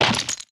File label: mined iceberg, mining; Factorio